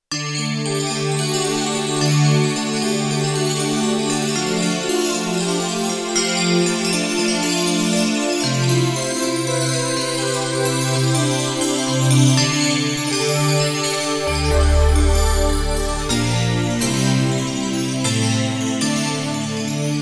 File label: Roland JD-800; Fantasia 90's; Factory Patches